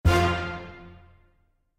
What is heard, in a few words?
Orchestra; After Wave Downfall